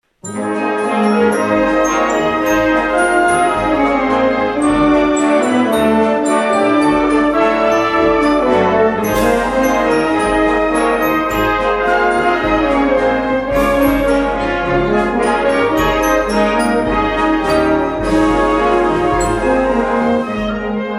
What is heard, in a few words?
Marcha Orientalis